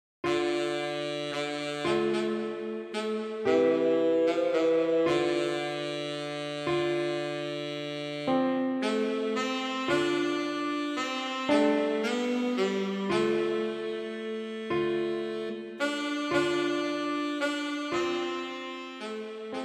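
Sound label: tenor saxophone; Scarborough Fair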